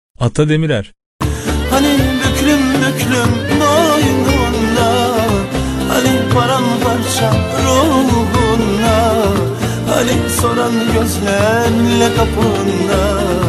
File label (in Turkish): vokal; Ata Demirer